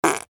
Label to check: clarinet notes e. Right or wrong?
Wrong